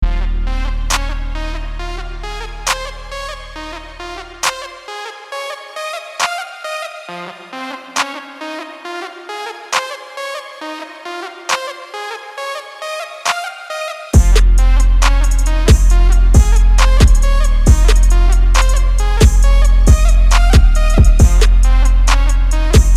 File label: Instrumental